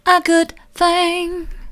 a good thing mid, vocal

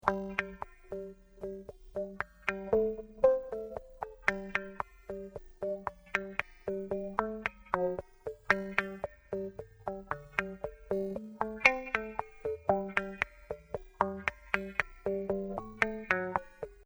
loop kicking mono

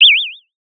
Sound of a bird tweeting nicely

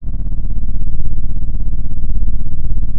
pop, Sound of an engine revving

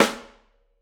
Piccolo, drum

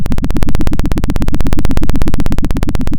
Sound of a helicopter

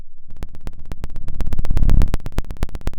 pop, Sound of a fire crackling

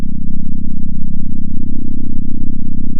center, sound of a small motorcycle

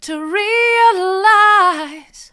to realise mid, vocal

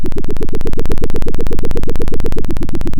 Sound of a plane taking off

